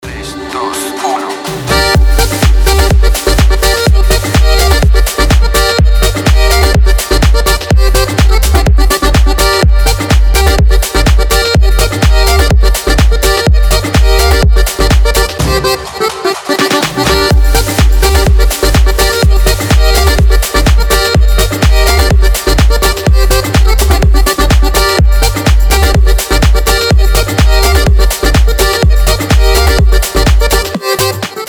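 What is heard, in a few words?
ringtone catchy funky house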